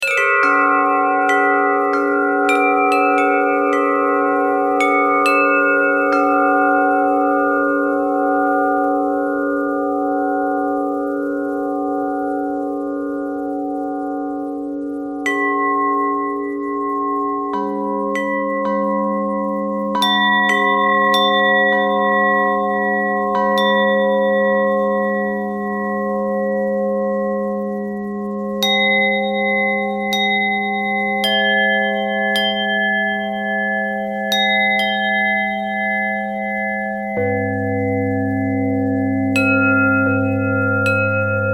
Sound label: bell thread